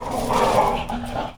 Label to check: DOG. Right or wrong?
Right